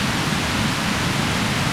Water; Zelda; OOT

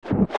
Arrow Hit Enemy; An arrow hitting an enemy

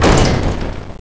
Barrel Unhinge; MC; The giant barrel in Deepwood Shrine becoming unhinged (one side then the other)

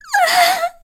Cry; Zelda crying (cornered by Yuga)

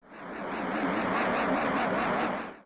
Poe Spirit; zelda; OOT

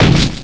Lava Block Flip; A lava block flipping over

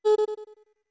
Voice A short; OOT

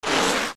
Big Deku Baba Lunge; zelda; OOT

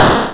Balloon Pop; A balloon popping (also heard when a Bonus Round ends); zelda

